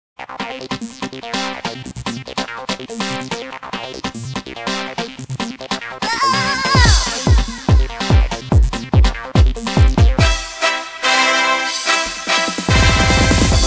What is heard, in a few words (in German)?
Techno Blasmusik